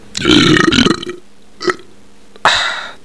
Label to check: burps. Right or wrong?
Right